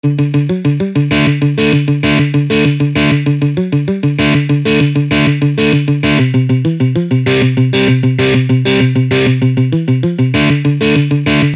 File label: Lick